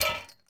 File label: ui drop chainlink; Rust